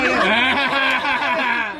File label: hahaa